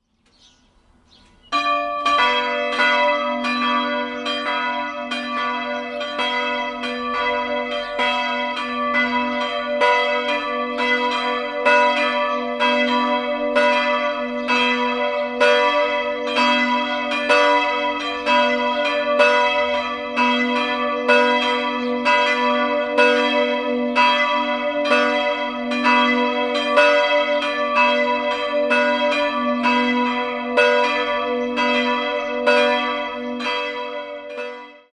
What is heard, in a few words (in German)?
glocken; Sollngriesbach